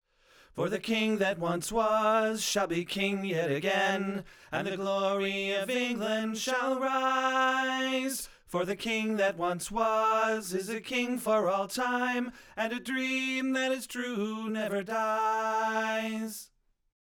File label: Tenor Harmony